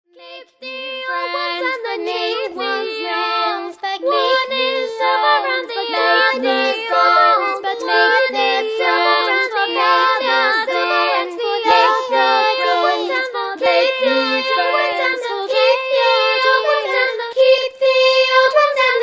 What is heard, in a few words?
Make New Friends (vocal)